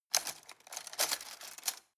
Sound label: cutlery plastic drawer rattle